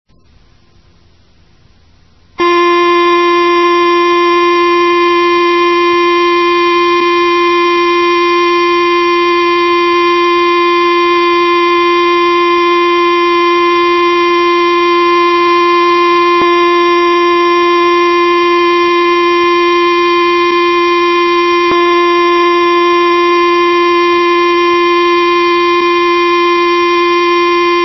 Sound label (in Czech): zkouška sirén elektronická